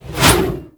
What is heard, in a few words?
Scythe Whoosh; Reaper